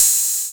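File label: 606 open Hi Hat; Quasimidi Rave-o-lution 309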